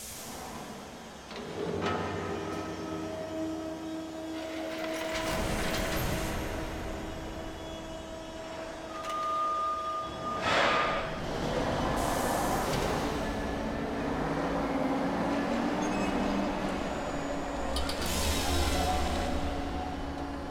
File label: Ambient Market Loop; Drifting Lands